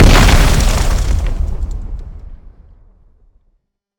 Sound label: Artillery Shell Explosion; explosion blast